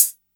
Airy Hat A Key, Royality free foot cymbal tuned to the A note. Loudest frequency: 9570Hz; hi-hat